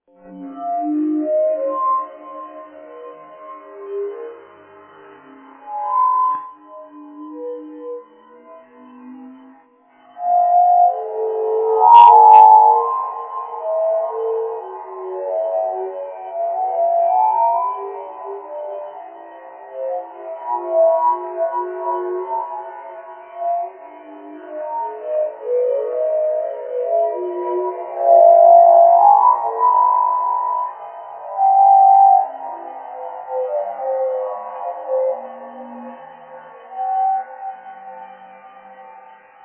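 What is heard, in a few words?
alien sound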